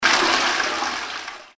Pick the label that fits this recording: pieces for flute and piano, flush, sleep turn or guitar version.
flush